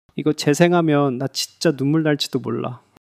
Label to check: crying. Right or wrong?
Right